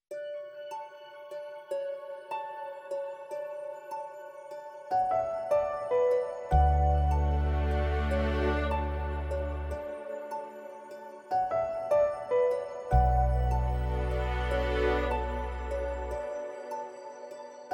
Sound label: lullaby rock